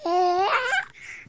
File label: beams; CosmicRage; general Baby